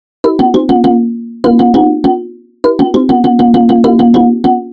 Percussive Elements

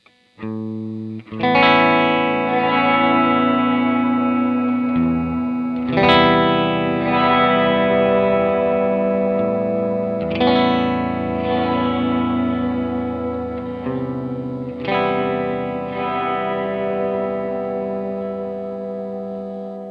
reverb delay

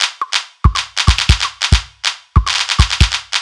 Master Beat

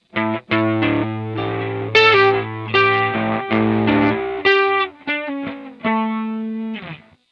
tape dist

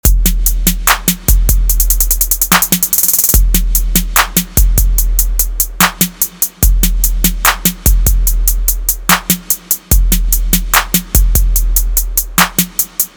SNARE DROP WITHOUT

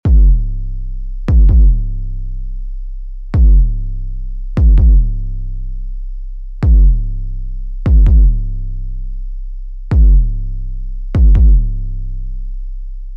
SUB BASS

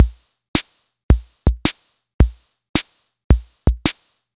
Boogie

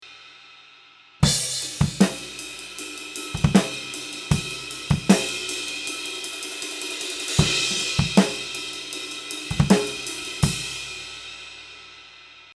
Section B Side

